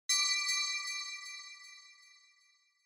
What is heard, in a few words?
Bell Accent High